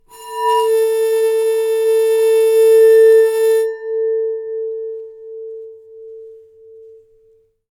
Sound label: Bowed, Large Wine Glass